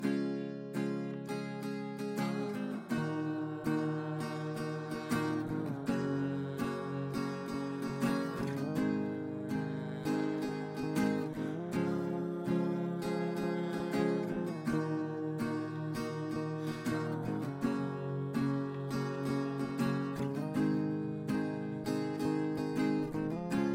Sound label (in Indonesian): Gitara Betul